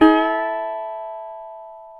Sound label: GTR, Dobros, National